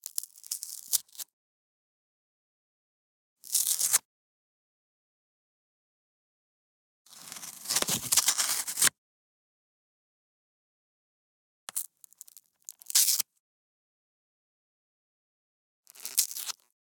rips and tears foam board rip; fvtt sonniss gdc audio

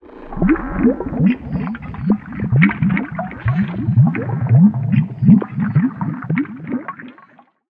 synthetic liquid short texture; bluezone; sonniss gdc

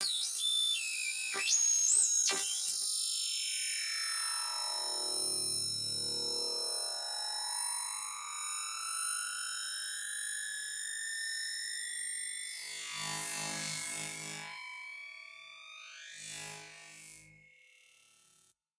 abstract fx; sonniss gdc

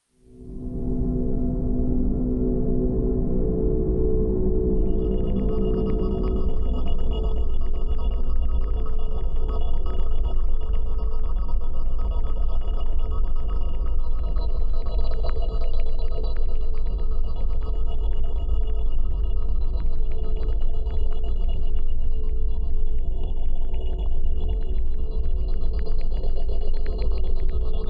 drone fluttering madness; sonniss gdc

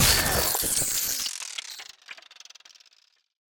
designed mecha elements sfx; sonniss gdc

